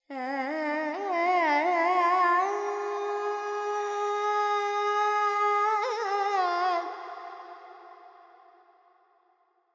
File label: FX Purvi Kalyani, Carnatic Female Alaps; Sample Banks